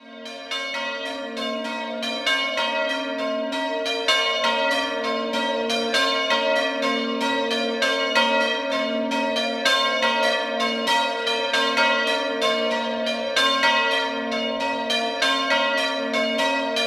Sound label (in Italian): Doppio, campane; Redenzicco